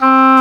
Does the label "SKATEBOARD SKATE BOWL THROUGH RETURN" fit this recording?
No